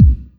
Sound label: Kick Step